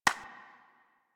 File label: Idle Clap; Warframe; Yareli